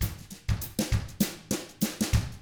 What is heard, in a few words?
FUNKY, Drums